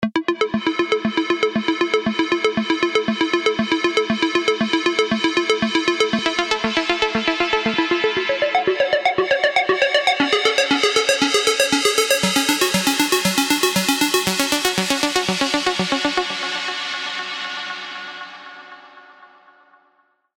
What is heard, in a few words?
Grandfather Clock, SERUM